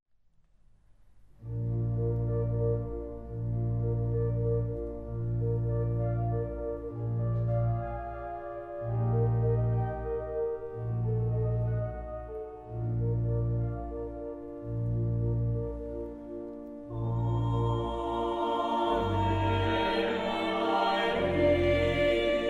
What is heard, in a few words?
Ave Vocal; schubert